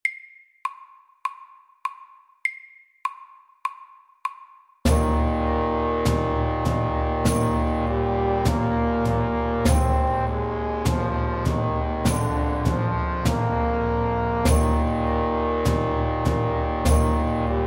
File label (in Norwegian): engelsk melodi FAST 100